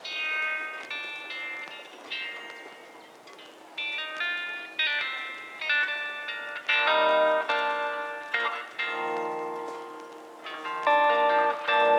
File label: lofi hip hop